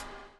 BUBL; Spectrasonics - Backbeat

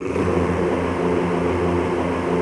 F1-R, Extended Classical Choir; Best Service

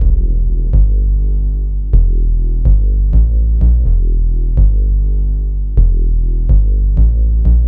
Bassline; Drums & Drones

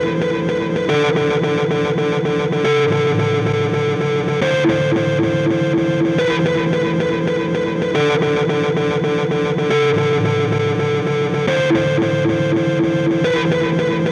Guitar, Indie Rock Anthems; Sonic Foundry (Sony Creative Software); Session 04 - Dubwyzz

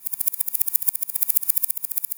Fill, Drums, Eclectic Electronica

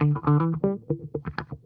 BACK 2 BACK, Funk Guitar; 90 s Sample CDs; Zero G

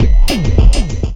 Bumpers; Sickly Fx; Sonic Foundry (Sony Creative Software); Esoterik Beatz

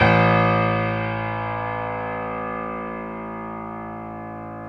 PNO XYPNO, Grand Pno, Roland; Club-50 - Foundations; Grand Pno 2

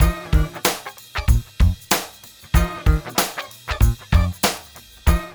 MIX, Funky Ass Loops; East West

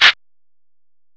CABASA, PERCUSN; M8; CMI Factory

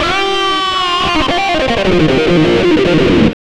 xtra lead

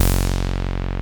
TEISCO; Masterbits; Vintage Voltage

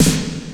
GATED S909; Masterbits - TR-Minator

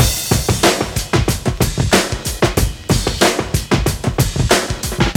DRM; AMG Gota Yashiki Groove Activator; GOTA GROOVES

